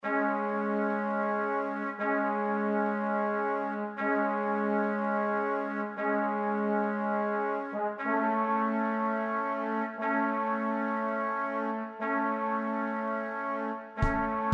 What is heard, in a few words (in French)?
Trompette Trombone Batterie